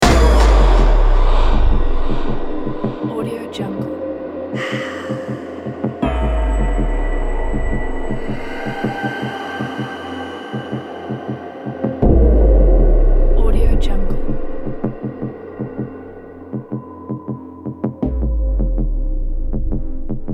horror background loop

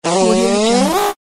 farting; archisound

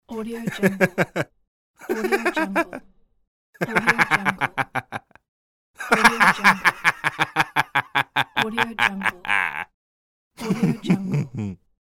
man laughing

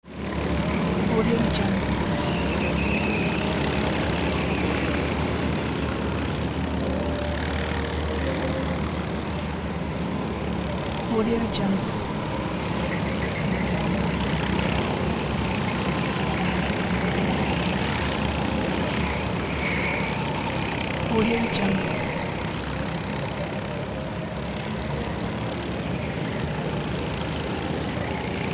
go karts racing background; hollywoodedge

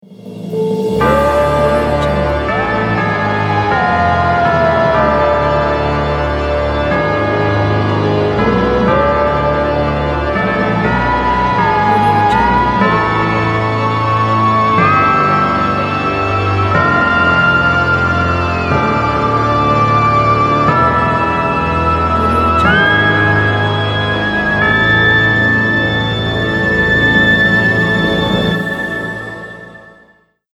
nightmare trailer

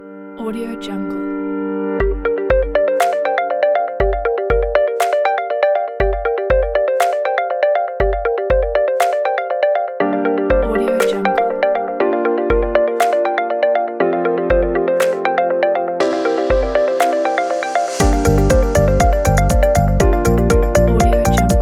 corporate for technology

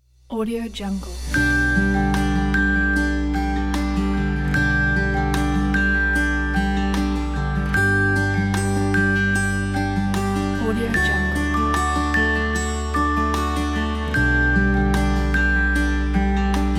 romantic guitar with bells; jn87

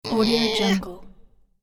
cartoon character effort

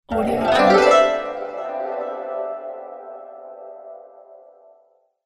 oriental glissando magic spell; fxprosound

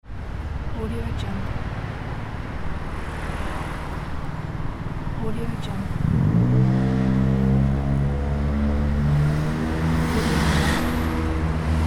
city

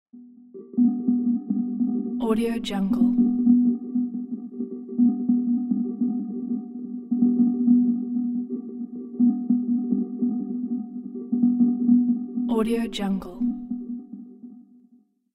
sonar

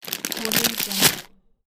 package rip open

